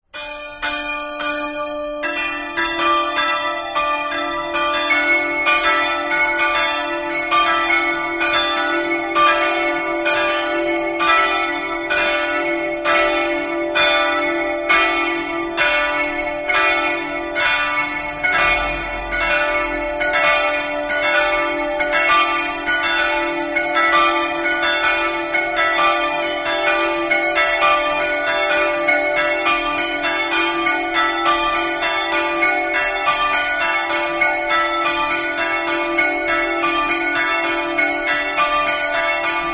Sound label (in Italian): campane; selz